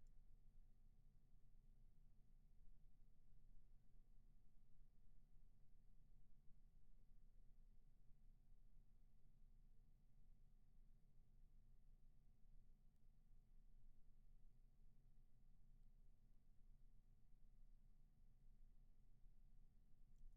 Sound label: room tone